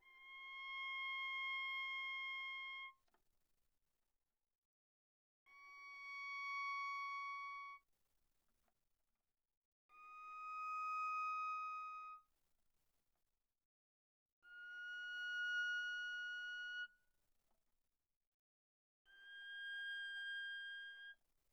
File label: Viola arco sul A pp C6 G6